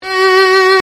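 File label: STR Solo Violin F3; CCMIX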